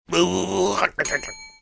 making funny sounds

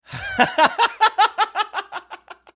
laughing

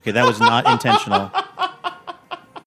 awsome laugh

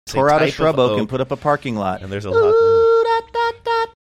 singing tore out shrub oak

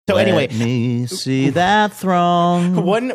singing let me see that throng

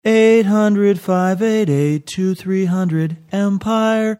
singing jingle; empire today

